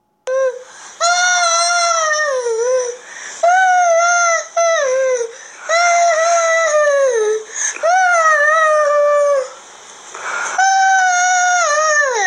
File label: niece singing